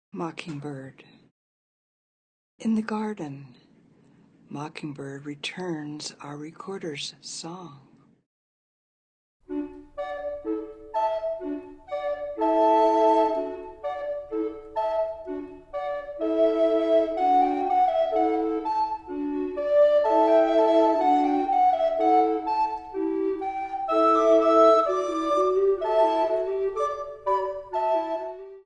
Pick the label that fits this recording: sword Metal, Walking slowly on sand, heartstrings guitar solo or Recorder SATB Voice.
Recorder SATB Voice